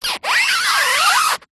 vehicle wheel turn tyre squeak road close up isolated clean